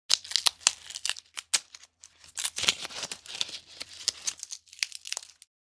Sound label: Hand peeling fruit; cooking